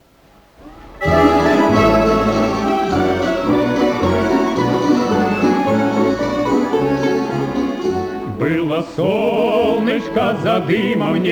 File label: duet